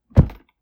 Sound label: Toolbag drop